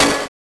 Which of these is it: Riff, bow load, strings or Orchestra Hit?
Orchestra Hit